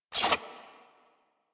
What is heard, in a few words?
Harpoon Gun Mech; Warframe; Sharkwing; Grineer